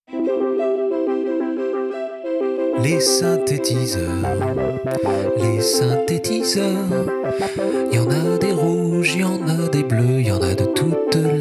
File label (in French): les synthétiseurs